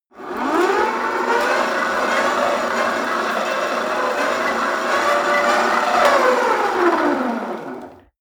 rope trainer pull sequence fast long

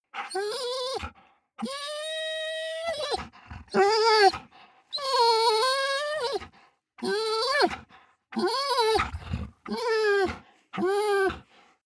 dog whine long; natur-tiere; hunde

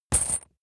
metal chain impact

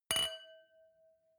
wine glass similar clink bounce; berufe; gastronomie; soundpool

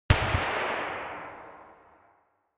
gun slapping pop gun ii

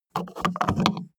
car bmw e46 exterior gas cap spin open short

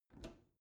bathroom cabinet open soft; objekte; tueren

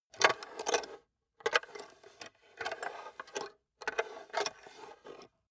small metal handling on wood; industrie wirtschaft; metall

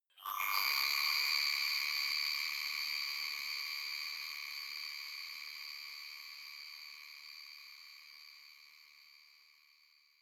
notification enchantment; signale

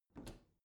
bathroom cabinet open soft slow; objekte; tueren

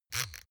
razor clatter single

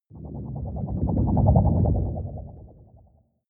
whoosh storm wobble hard; swooshes-swishes

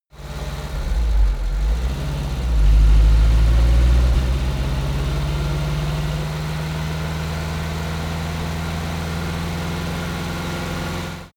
car dacia idle accelerate high rpm

